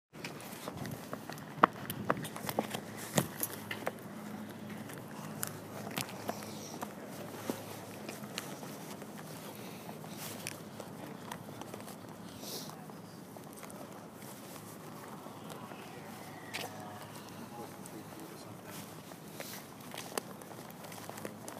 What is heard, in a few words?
Student Center